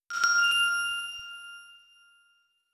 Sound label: rating, Sounds